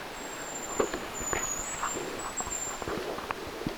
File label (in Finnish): pyyn laulusae